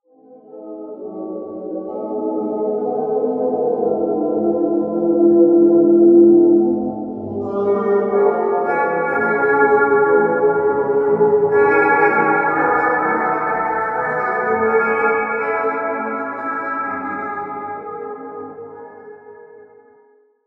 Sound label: Jazz